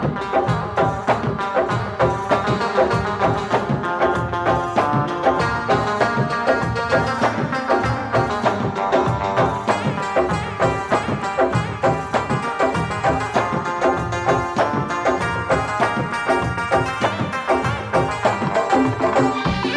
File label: Song Instrumental Ringtone